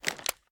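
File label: pistol reload empty fast extended mags large end; gmod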